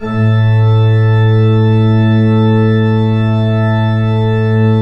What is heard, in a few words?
PIPE, 3DOrgans